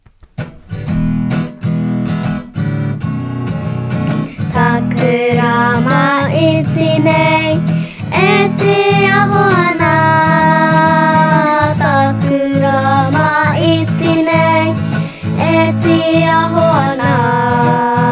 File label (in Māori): sung by nga rangatahi